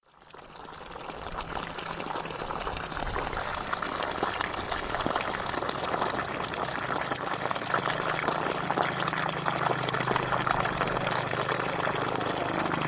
Bruits d eau dans la nuit

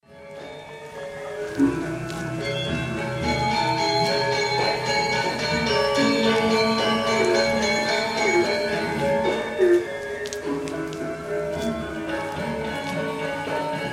Orchestre de gamelan depuis la rue

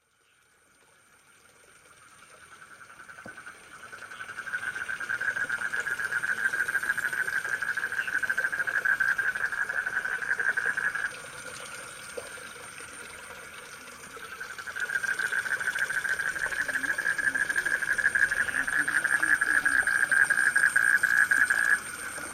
Crapauds et insectes

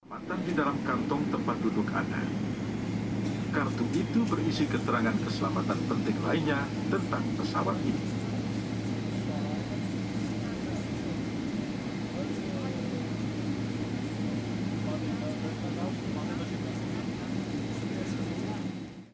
dans l'avion pour Jakarta

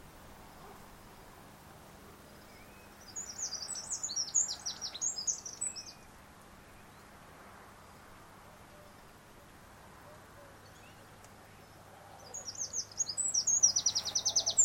Oiseaux dans le cognassier

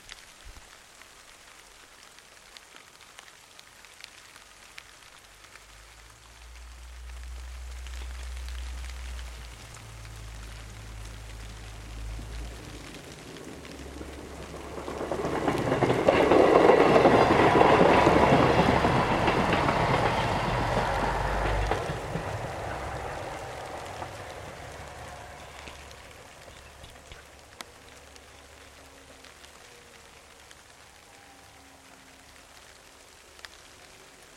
Passage de la Micheline sous la pluie

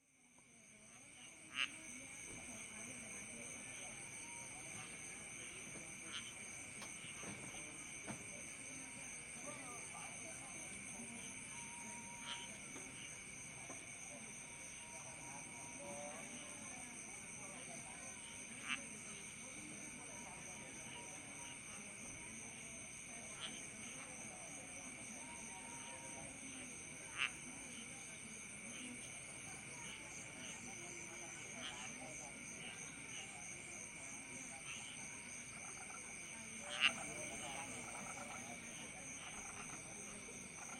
Crapauds

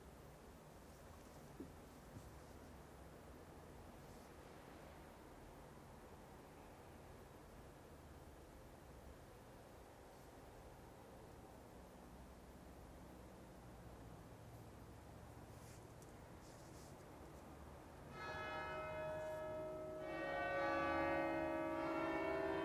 Dans la cathédrale

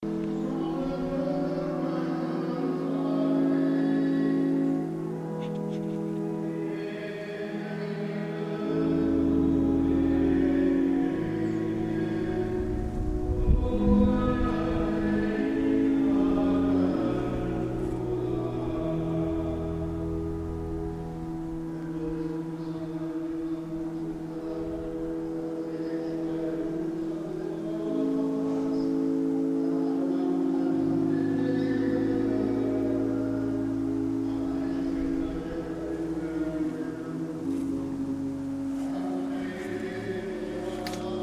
Messe à Minihy

